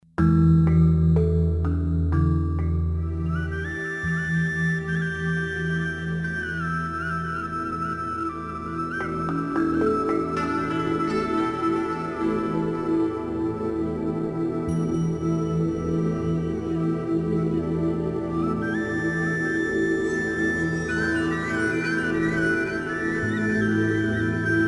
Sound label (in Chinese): 专题片背景音乐